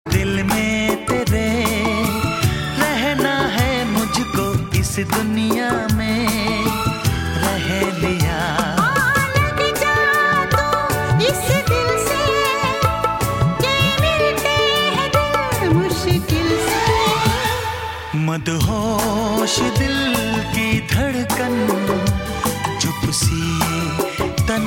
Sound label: Hindi Sweet Ringtone